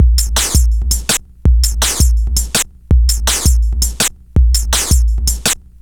Revamped 165; Zero-G Jungle Warfare 1, 2 & 3

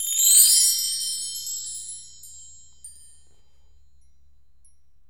BELL TREE; GM PERC KIT; AKAI S-Series CD-ROM Sound Library VOL-5

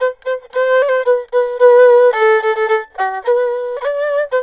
ERHU 110 G; INIS - Opium

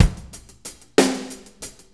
6-8 Loop, Full Kit Loops; Drum Tools

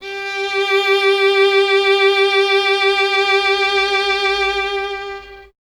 violin, Solo Instruments

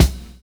PICCOLO

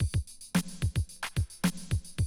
drum loop, 100 BPM

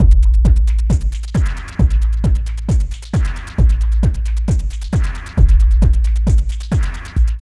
LOOP, Tekno Industrial; East West

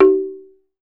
TUNG HI, Tongue Drums; E-MU Producer Series Vol. 7 – Old World Instruments

